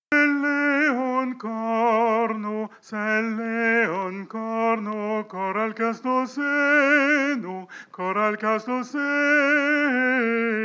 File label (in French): voix